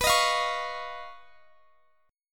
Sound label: D sharp 7th Suspended 2nd Sharp 5th